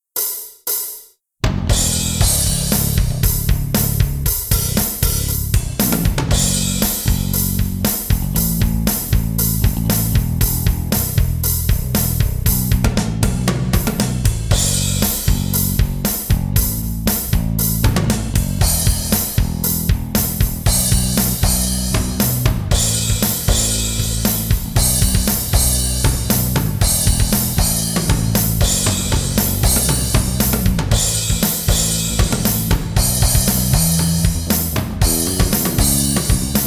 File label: bass guitar